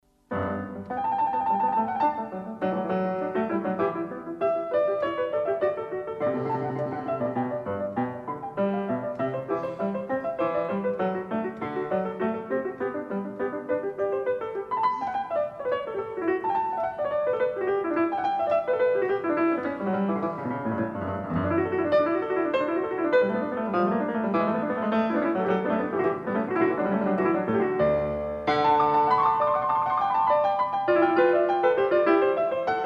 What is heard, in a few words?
Bach Goldberg Variations